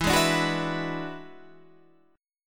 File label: E 9th E, guitar